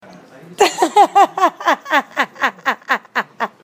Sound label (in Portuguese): ain risada